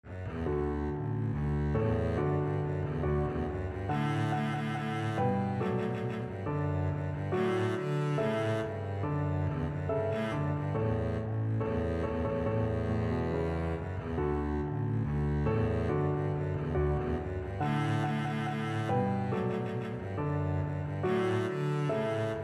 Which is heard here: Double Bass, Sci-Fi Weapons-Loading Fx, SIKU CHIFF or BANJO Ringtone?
Double Bass